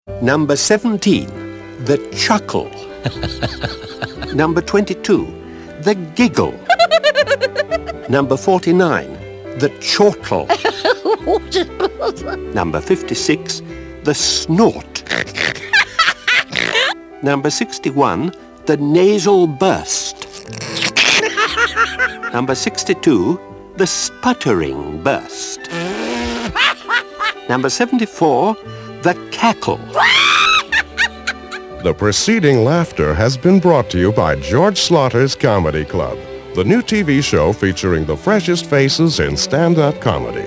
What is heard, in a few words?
laugh catalog